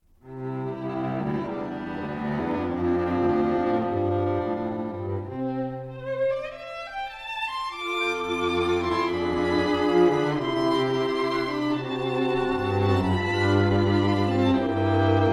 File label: String Quartet; Gershwin